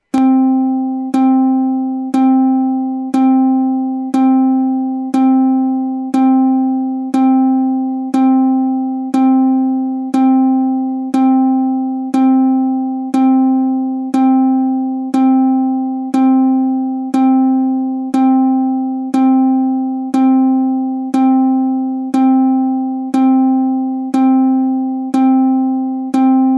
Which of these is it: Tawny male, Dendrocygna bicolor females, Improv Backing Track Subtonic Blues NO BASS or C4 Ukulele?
C4 Ukulele